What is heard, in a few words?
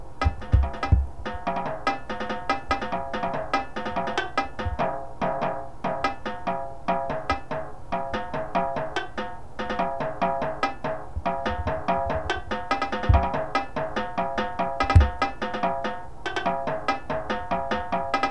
tenors, drumline